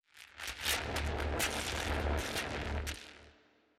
Power Ups Health

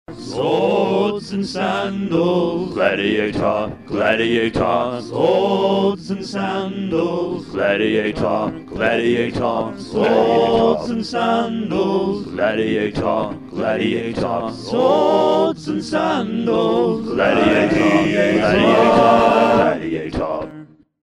Acapella Chorus; Swords and Sandals 2

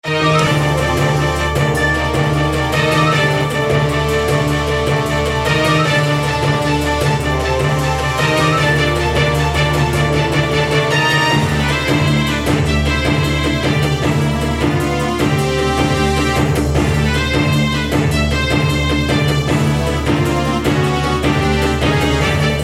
Bgm Fantasy

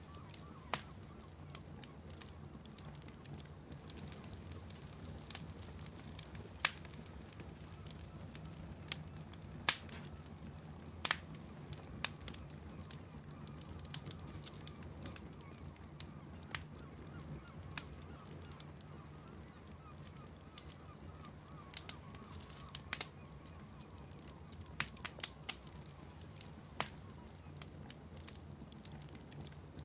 DINING NO CLOCK; The 39 Steps

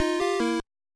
Item Selection; Bad Move, NES; Nightshade

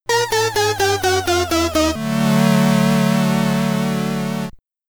Time's Up, Commodore 64 SID; pp hammer

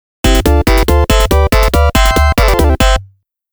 Game Over After All Clear; toaplan arcade sound digital collection vol.05

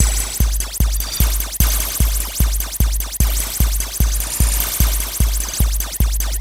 Clockwork Machines - Electro; LSD Dream Emulator; PS1

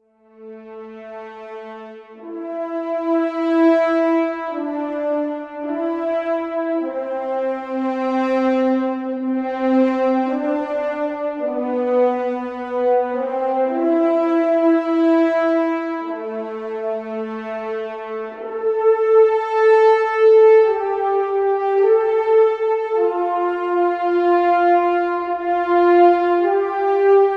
Triumphant Horns; Global Conversation

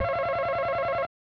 Buzz In; Family Feud; SNES

